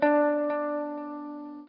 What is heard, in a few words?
NOODLE LONG, Guitar & Bass